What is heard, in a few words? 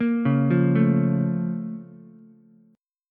Bb Minor Major 7th Bb down Ukulele, BbmM7 chord; arpeggiated; JGuitar